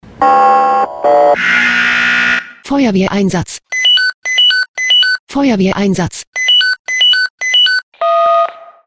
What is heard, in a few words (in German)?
SMS Alarmierungstone Feuerwehr Einsatz